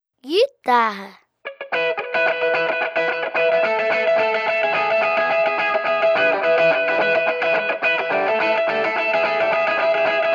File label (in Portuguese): GUITARRA